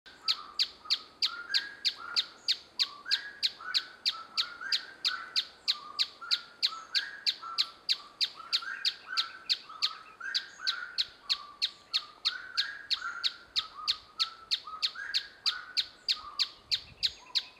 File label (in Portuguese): Canto do tapaculo ferreirinho